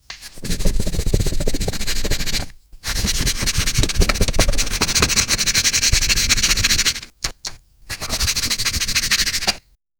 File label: drawing playing cards